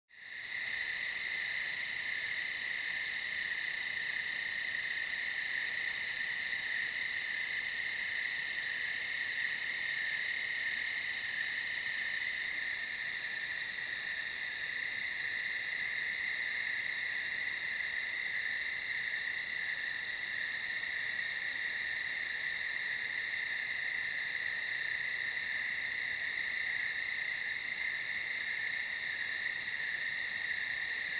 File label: Crickets at Night on field